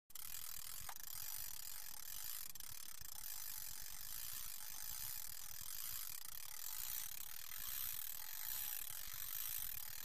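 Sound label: Fishing Reeling Slow, fishing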